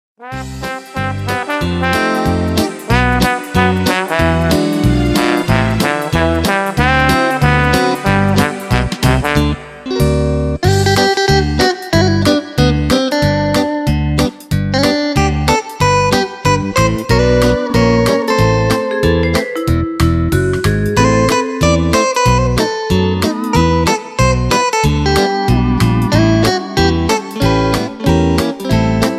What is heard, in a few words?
GUITAR